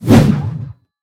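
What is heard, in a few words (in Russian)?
groznyi zamakh vikinga molotom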